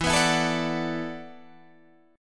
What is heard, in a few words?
E 7th Suspended 2nd Suspended 4th, down; E7sus2sus4; strummed